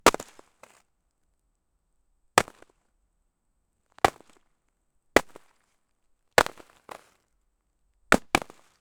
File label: a snowball hit on target; winter